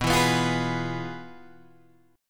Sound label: F Minor Major 7th Flat 5th, B